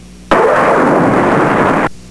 Blast

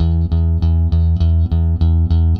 BASS, ROCK POP; MAGIX

